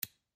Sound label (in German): Zuender